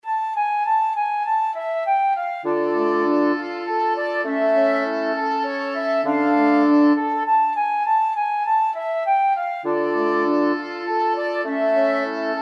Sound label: wind quintet; beethoven fur elise